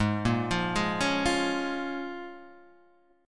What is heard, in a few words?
C sharp 6th, G sharp, guitar chord